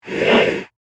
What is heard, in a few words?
Whoosh Fast Wipe; Whoosh Sound Effects; SoundEffects-BlastWave